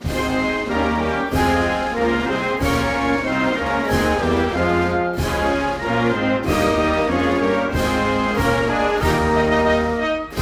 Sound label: Serbian national anthem, instrumental; Сербия